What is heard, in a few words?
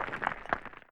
Vent Move Ground; Among Us